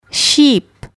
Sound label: sheep